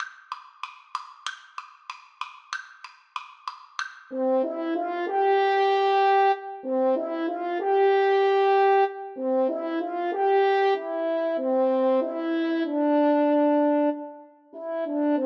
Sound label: when the saints, French Horn Duet